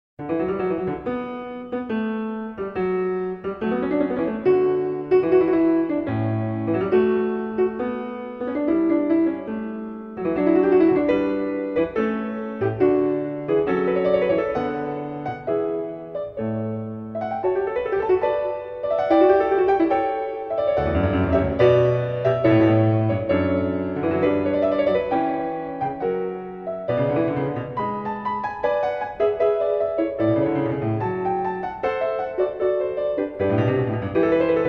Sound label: Fugue V, D-dur, Well-Tempered Clavier; J.S. Bach; BWV 850